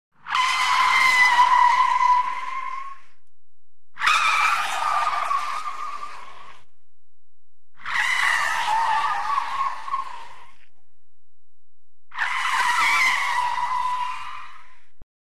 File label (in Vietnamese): Tieng phanh gap cua xe o to